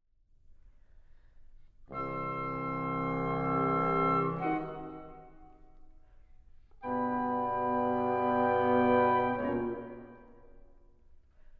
Quintet for Winds

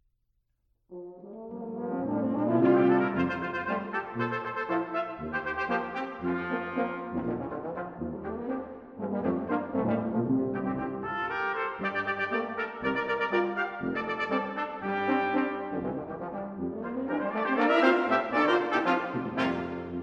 Brass Quintet Half Note=112; Brass Quintet No. 2. - III.; Art Collection music of Arthur Frackenp

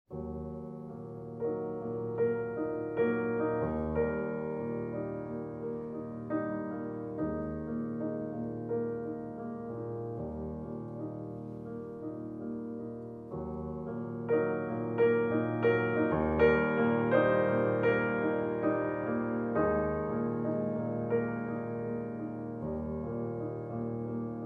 Sonata for Cello and Piano - Andante

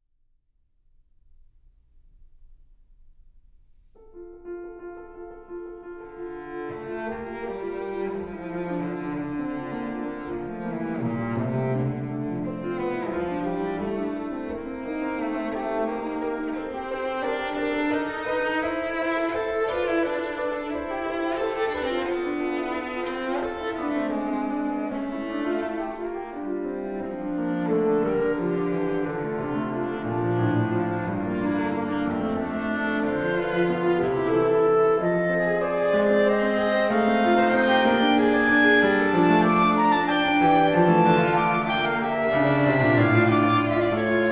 Piano Trio, Allegro ma non troppo

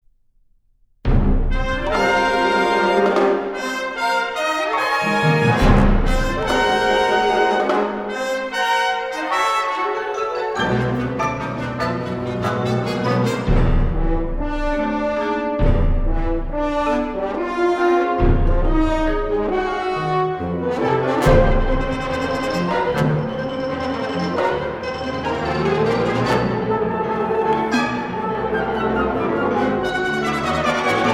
Concerto For Trumpet Wind Ensemble, Allegro

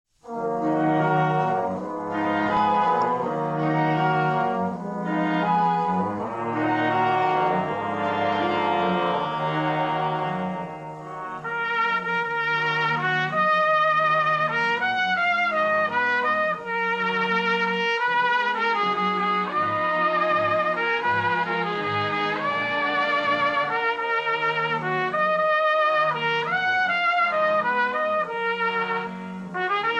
Violin Concerto, Trumpet

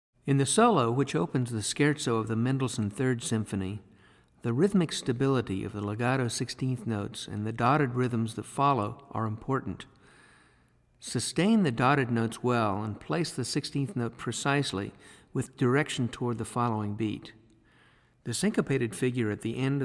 Symphony In A Minor, Clarinet; Symphony #3; Scottish

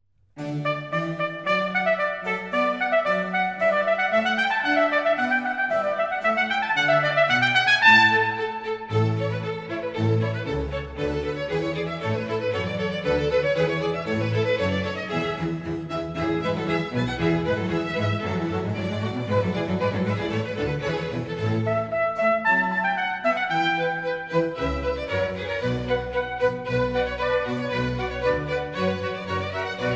Trumpet Concerto In D, Allegro

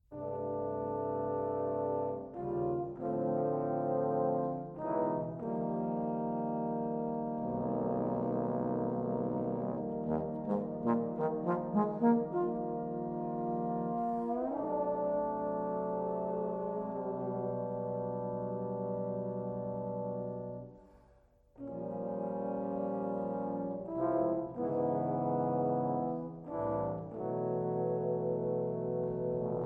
Concerto for Bass Trombone and Trombone Ensemble

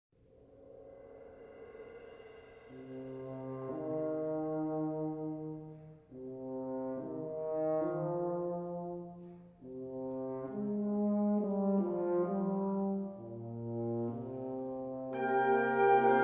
Symphony In Brass; Mvt. 2. Andante con moto

